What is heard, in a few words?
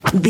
whoosh weapon knife swing